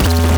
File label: Bass